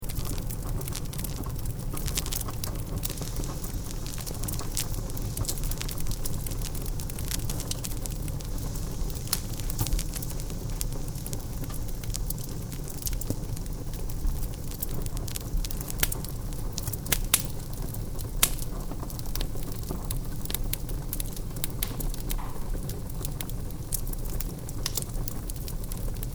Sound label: fire stove